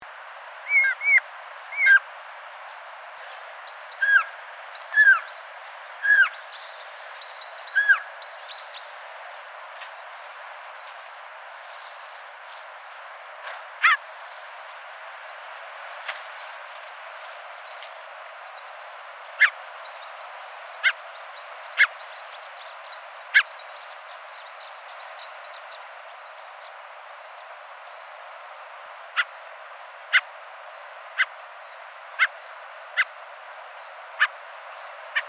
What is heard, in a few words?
Grey Jay Perisoreus canadensis obscurus